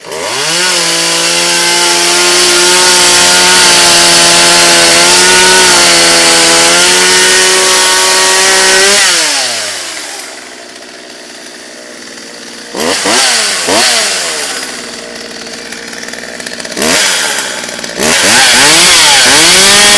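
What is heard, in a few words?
Chain Saw Steady Motor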